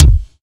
original bass drum single hit d key, bass drum sample tuned to the D note